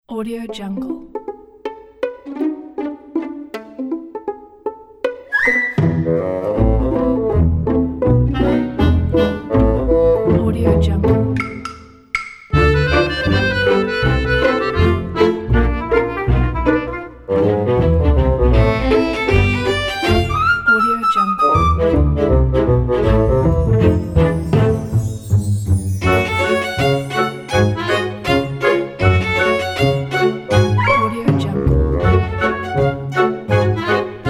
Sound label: eccentric ochestra